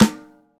reverb steel snare drum A key, acoustic snare sound tuned to the A note